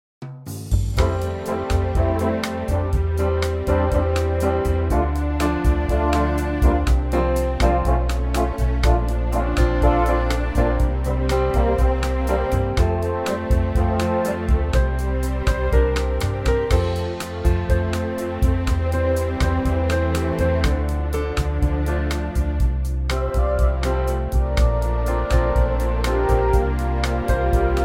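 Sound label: orchestral Bossa F, male; key - F - vocal range - C to D (optional F top note); Lovely orchestral Bossa arrangement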